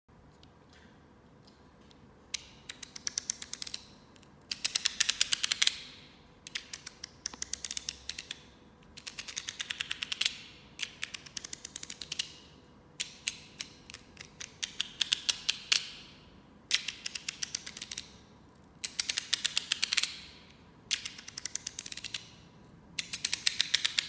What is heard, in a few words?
Cutter; افکت صوتی